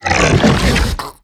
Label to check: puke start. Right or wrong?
Right